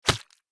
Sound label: Worm falling impact on surface; slug and worm